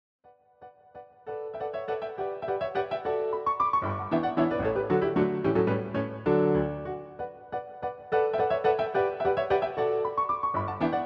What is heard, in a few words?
Minuet, piano roll; L Arlesienne; Bizet; Rachmaninoff